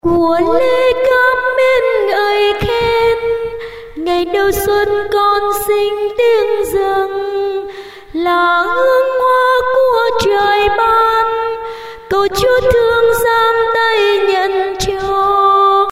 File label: tenor